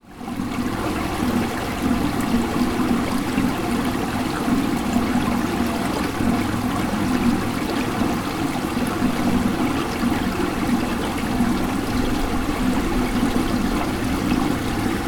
Fountain Fontaine

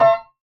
Piano F Minor Chord 5th Octave

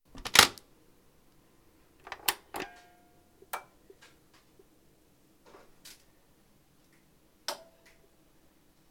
Switch On

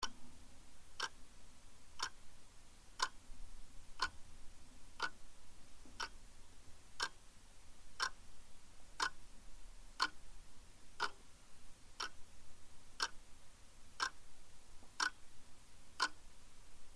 Electrical Old Junghans Clock Ato Mat

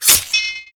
Cut Through Armor Slice Clang

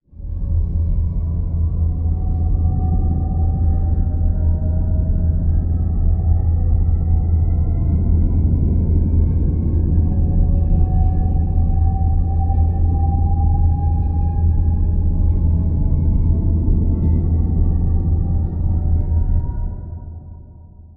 Large Capital Space Ship Flyby

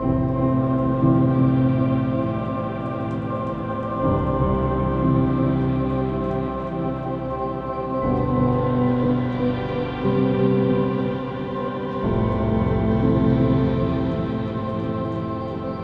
Dreamy Piano

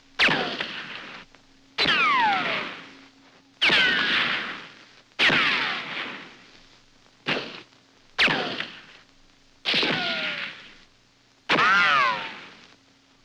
Rifle Ricochets

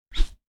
Whoosh Sound

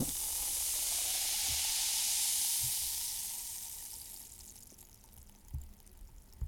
Fizz

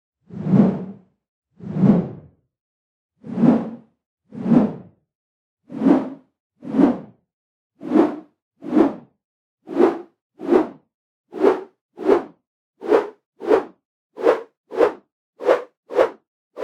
Rope Swoosh With Added Pseudo Doppler Effect

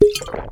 Potion drink long

